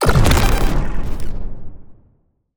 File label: Sci-Fi Grenade Explosion